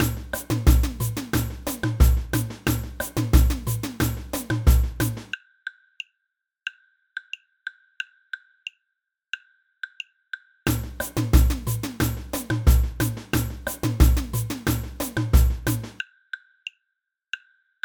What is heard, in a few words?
block, samba; samba band